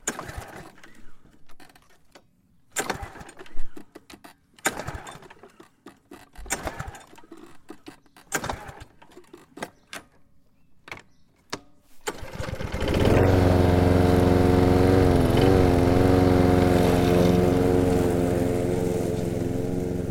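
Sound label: petrol lawnmower Hayter Harrier starts and mows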